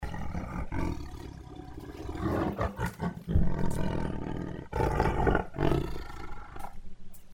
tiger click growl; animal sounds

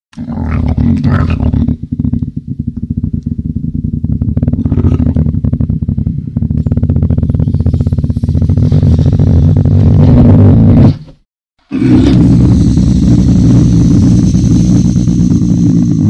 lynx; animal sounds

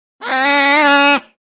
sea otter; animal sounds

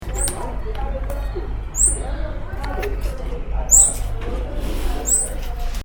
otterpup squeek; animal sounds

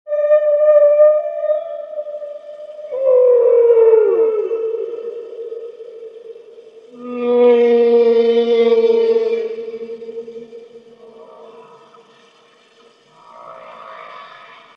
hump back whale; animal sounds

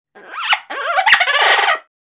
macaw; animal sounds

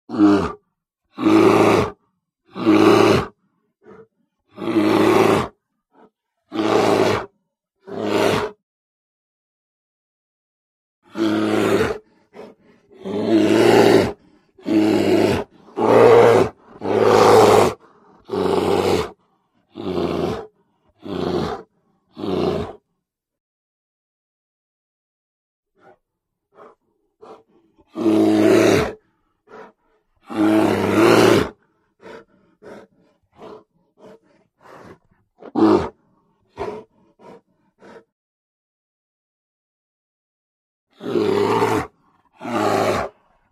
brown bear sound; animal sounds

